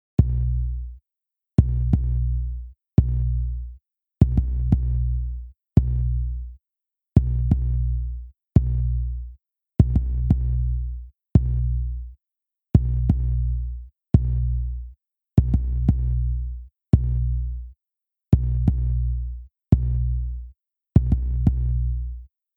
Hip Hop Sub Kick

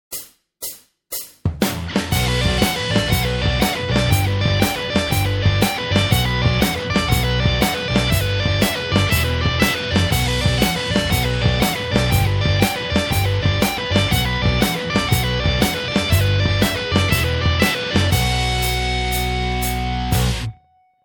guitar basics tapping full

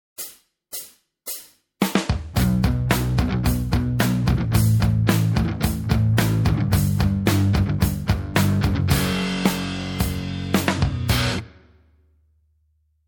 guitar basics ho po

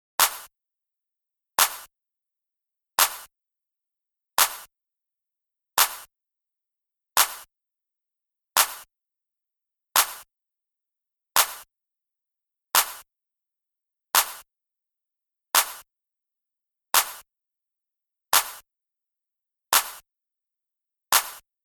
Hip Hop Claps

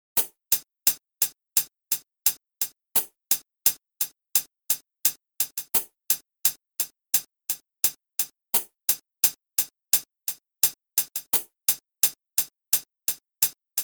HipHop Hats

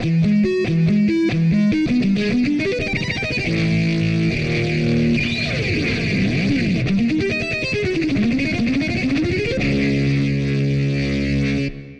HALionOne Distorted Guitar; cubase5; VSTExpression

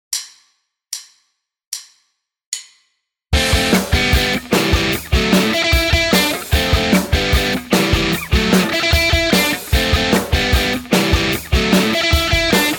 Riff, guitar; riff; short alternate-picking; Take Heed In Cumbria; Pickipedia: learn to play 23 rhythm guitar riffs

